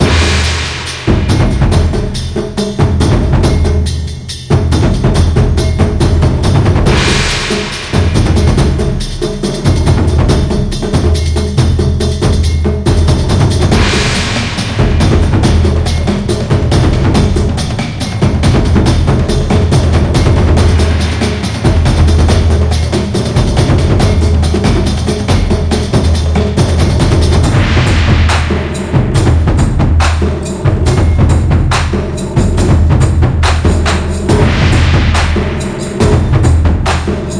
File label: Drums; the madness of little emma